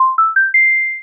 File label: notification chime